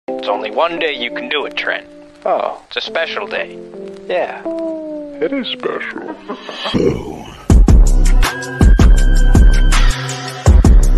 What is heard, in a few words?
halloween theme song remix